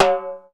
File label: TIMBALE; Univers Sons; Basicussions